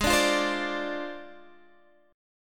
F Minor 6th, G sharp

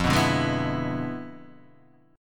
E 7th F, guitar; strummed; chord; JGuitar

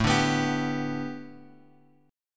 A 9th Suspended 4th Bass Standard, chord; A9sus4; strummed; JGuitar

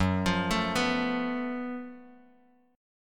F sharp Minor Major 11th, Bass Standard, chord; arpeggiated; JGuitar

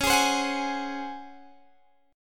Db Major 7th Suspended 4th Db down Guitar Drop D

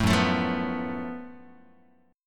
F sharp Minor Major 7th Double Flat 5th, Guitar; strummed

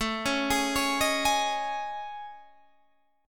A Major Sharp 11th, Guitar Open D

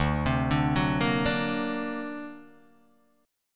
D Augmented 7th D down Guitar Open D

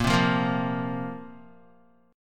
A sharp Suspended 2nd down Guitar; strummed; A#sus2; JGuitar

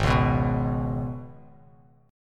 Ab Minor Double Flat 5th down Bass Standard; Abmbb5; strummed

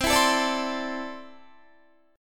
C 6th Add 9th C down Guitar; C6add9 strummed

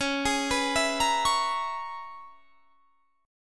Db Minor 13th, chord; Dbm13 arpeggiated; 21,23,21,21,23,21; JGuitar

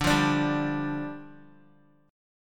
D Minor Double Flat 5th, down, Guitar; Dmbb5; strummed; JGuitar

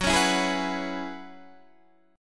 Gb 9th Suspended 4th Gb down Guitar Open D, chord

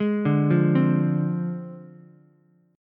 F Minor 6th, Ukulele; Fm6 arpeggiated; JGuitar

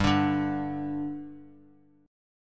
G 5th G Guitar, chord; G5 strummed

